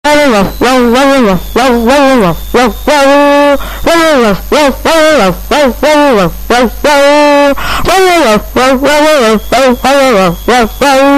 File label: Nokia Dog Mix; Nokia New